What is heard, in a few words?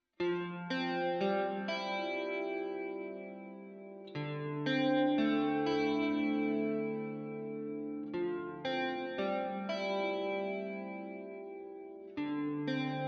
Chorus, modulation; modulation pedals